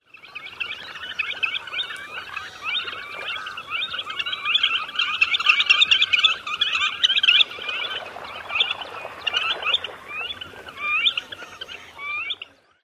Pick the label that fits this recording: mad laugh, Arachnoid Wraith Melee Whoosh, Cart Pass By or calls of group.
calls of group